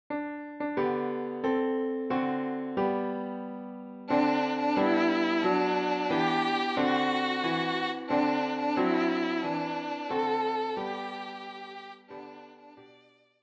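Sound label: violin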